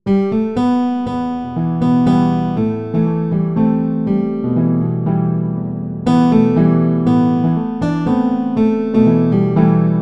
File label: guitar